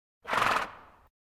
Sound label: Animal effects snorts grunts farm ambience